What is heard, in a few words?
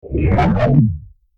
time start; FXHome